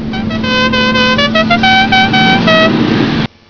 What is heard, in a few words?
Dukes of Hazzard horn